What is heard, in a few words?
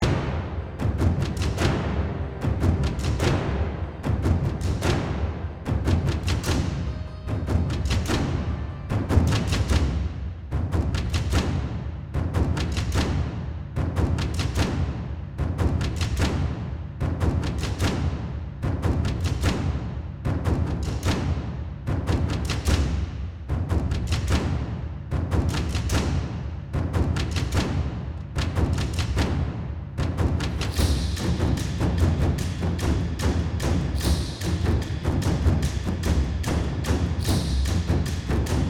Castle Combat; Ferelden; Intensity